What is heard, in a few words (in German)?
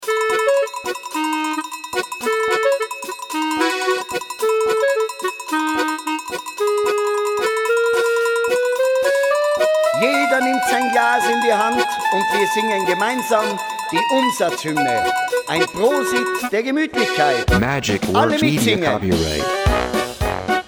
Volksmusik